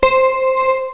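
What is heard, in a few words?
synth pluck c5; Star Trek demo; Star Trek Audio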